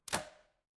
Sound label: door lock